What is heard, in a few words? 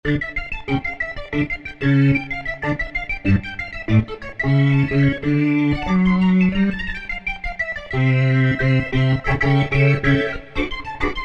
Prog Organ, Roland V-Synth; Factory Patches